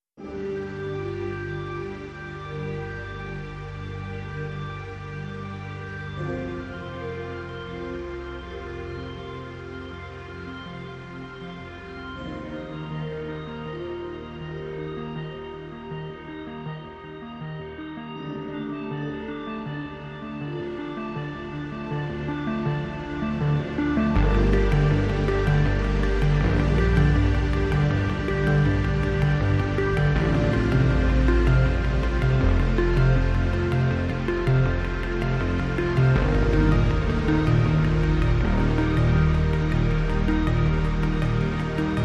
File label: Synth Stem